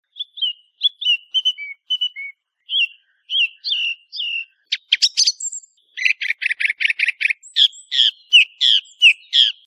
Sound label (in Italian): Tordo